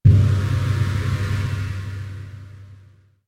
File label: Searing Chains; Ember Spirit